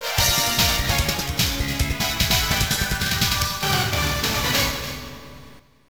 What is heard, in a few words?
Game Start; V Goal Soccer